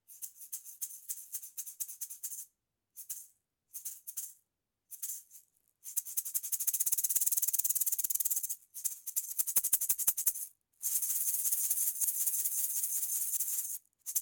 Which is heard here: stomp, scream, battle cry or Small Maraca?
Small Maraca